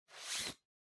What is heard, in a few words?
Sensu Case Take Out